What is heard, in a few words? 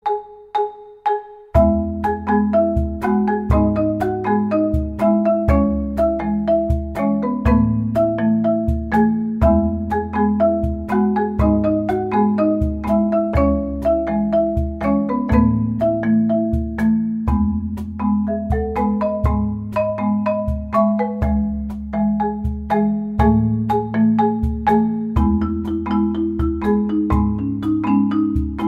Marimba